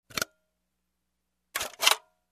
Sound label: Camera Projector Shutter Sound